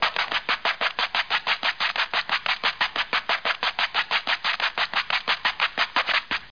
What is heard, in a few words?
scratcing flee; DR BRAIN; BUGS